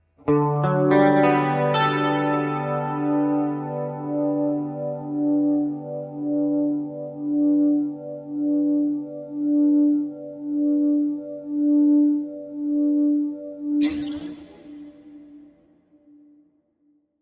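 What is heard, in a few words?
Electric Guitar Melancholy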